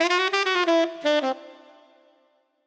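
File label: Cheeky Triplet 4beat D major 90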